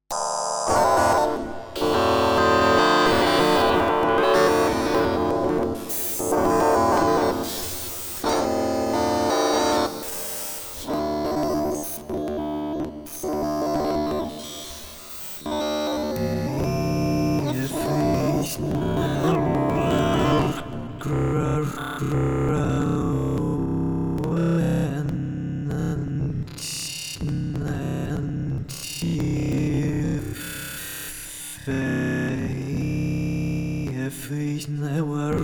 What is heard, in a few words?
Transwave Modulation